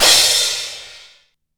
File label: CYBER KIT, DRUMS